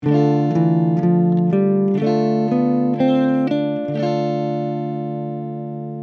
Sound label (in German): Dorisch; D Dorian with D Minor Chord